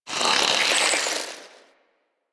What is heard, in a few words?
plant murmur; Ambience; Props; Warframe; Infested Eris